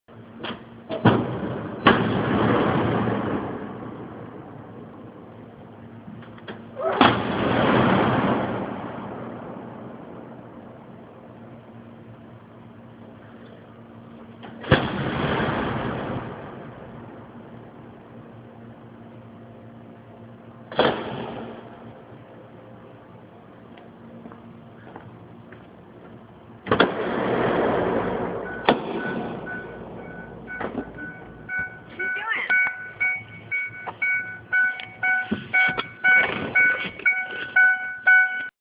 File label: parking lot reverb